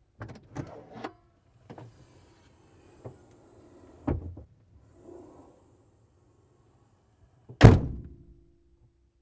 Expedition Rear Door, ford expedition

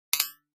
Button Toy Drum Hit

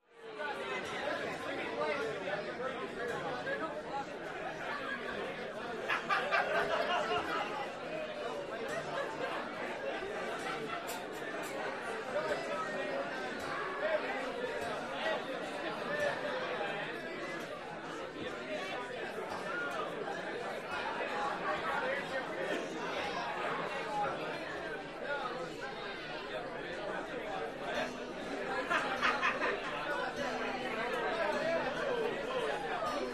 American Bar Chatter, bars; bars & discos

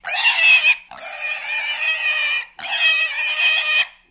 Pig Screams Wails X3

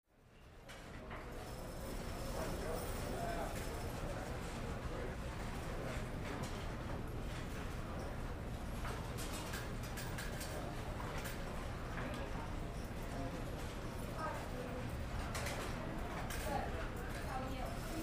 Casino Amb, slot machines

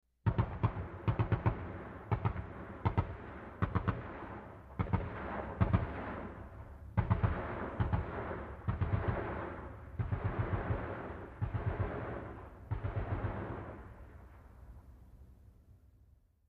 .50 Caliber: Firing, Distant

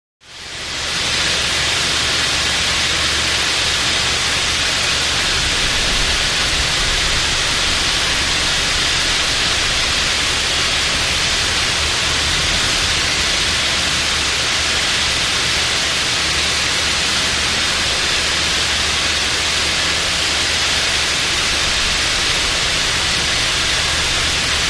Hose High Pressure Cl

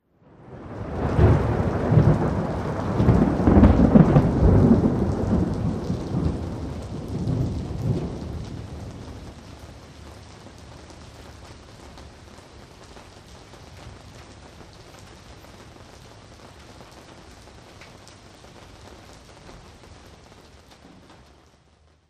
Thunder Roll One Time

